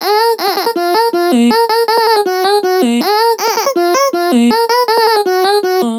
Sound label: Vocal Loop 160 D#; Vocals; Advent Calendar 2019 Day 23 - Vocal Loops